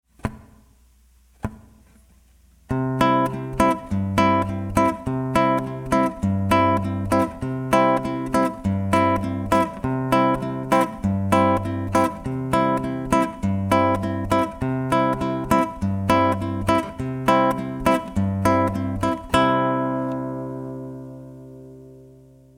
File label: right choro, brazilian guitar; brazilian rhythms